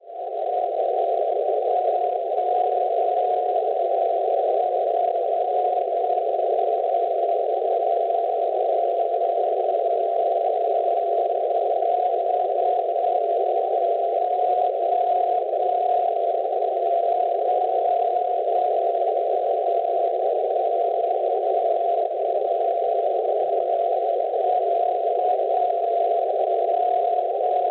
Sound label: NDB Hof 484 kHz; Schwarzsee